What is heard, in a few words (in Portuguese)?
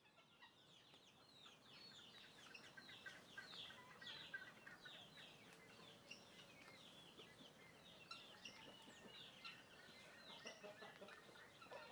Galinhas e pintinhos andando em volta do microfone; aves